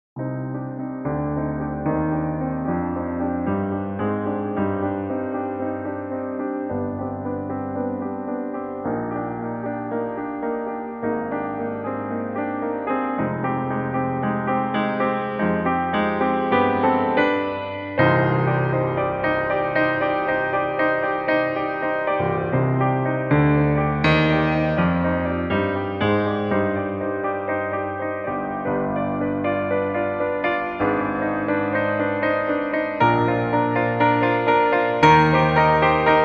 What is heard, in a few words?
Prelude C min